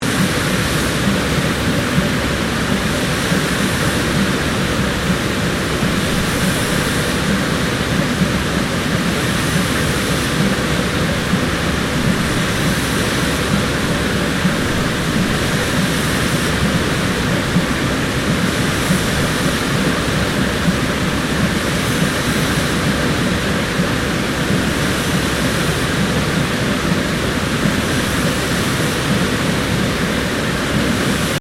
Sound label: FACTORY BOTTLE MAKING AMBIENCE; General factory Ambience; Machinery; Machines